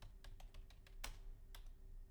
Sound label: keyboard; IEEE AASP